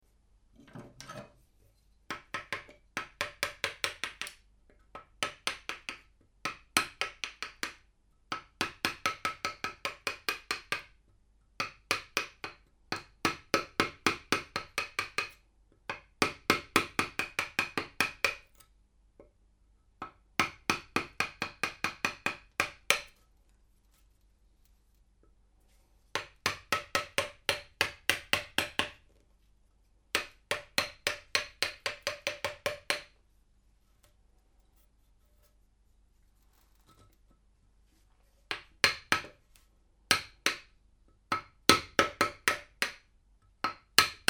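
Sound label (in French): gouge plan proche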